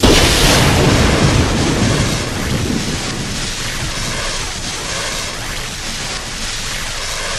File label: force lightning; lightsaber